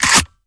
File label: Reload Chg Hndl Fwd, weapons; ares